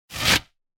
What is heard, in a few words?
whoosh tube more dynamic